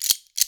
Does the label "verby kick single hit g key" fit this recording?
No